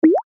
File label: bubbles